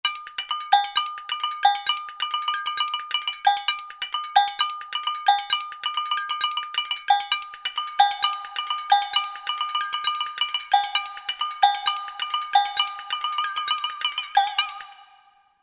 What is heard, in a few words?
Agg Btl, World Collection Latin; Roland SR-JV80-18 World Collection Latin